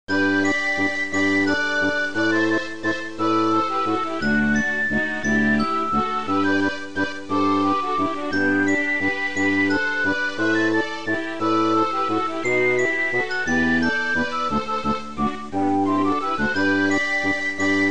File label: waltz